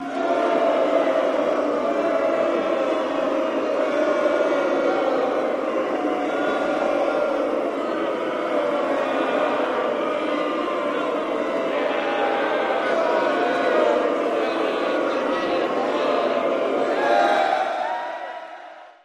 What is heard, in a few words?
Crowd Boos Reaction